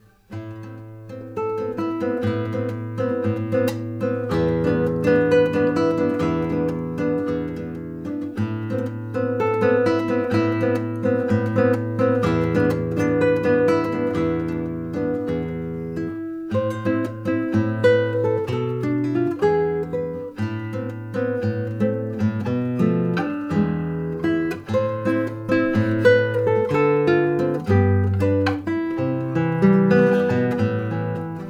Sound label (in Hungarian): gitar probalgatas